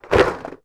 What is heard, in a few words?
leisure board game box place down; yahtzee